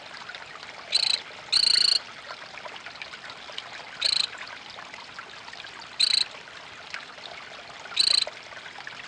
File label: Hylodes nasus